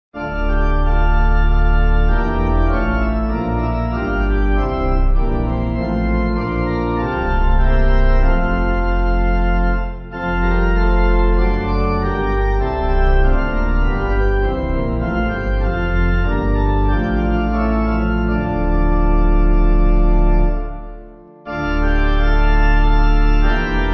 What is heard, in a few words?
When Christ Was Born Pipe